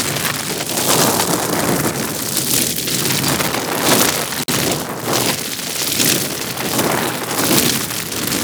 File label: Eruption Fire Loop; Sargas Ruk